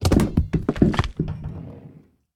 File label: orange fall